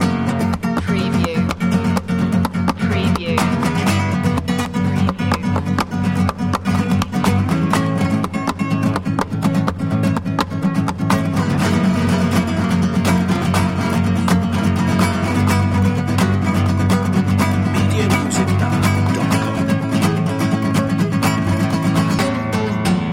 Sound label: flamenco party loop